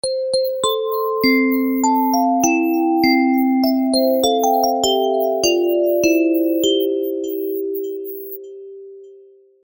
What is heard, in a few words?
lovely soft lullaby sms tone